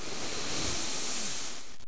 inhale

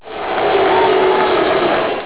boo crowd

